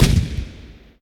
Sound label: boom, skyrocket; xbmc4xbox; screensavers; rsxs-0.9